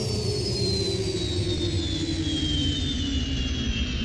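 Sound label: engine decelerate ywing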